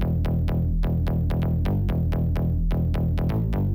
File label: 8 Bit Blitz Intro; metagalactic blitz